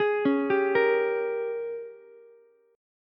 Ab Major 7th Suspended 2nd Suspended 4th Ab, down, Ukulele; arpeggiated; JGuitar